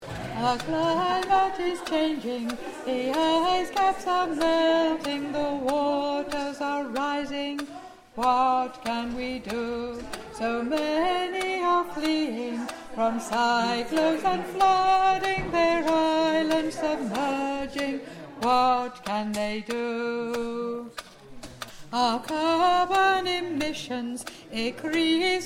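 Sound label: Alto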